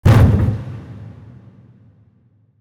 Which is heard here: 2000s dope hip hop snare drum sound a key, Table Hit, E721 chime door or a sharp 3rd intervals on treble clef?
Table Hit